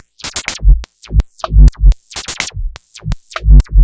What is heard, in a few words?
Percussive